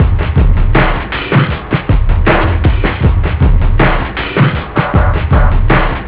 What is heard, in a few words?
break, house; OctaMED SoundStudio V1